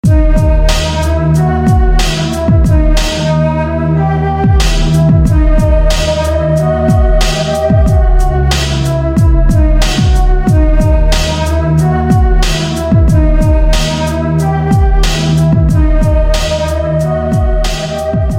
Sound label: Fear Flute